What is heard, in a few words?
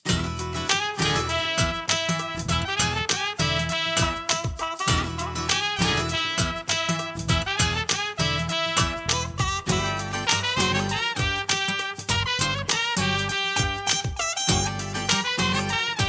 Funky, ringtones; Vestel Venus